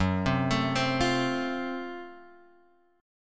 Gb Minor Major 7th Flat 5th, Guitar, Open G, chord